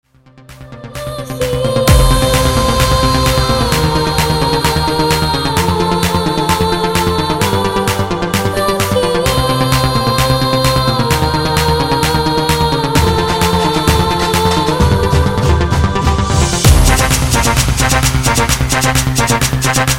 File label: Remix